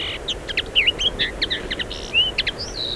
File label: Carduelis cannabina